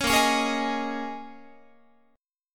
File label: A sharp Minor 11th A sharp down Guitar, chord; A#m11; strummed